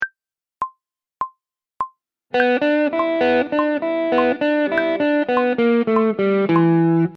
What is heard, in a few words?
lick blues; guitarra; 3 licks de blues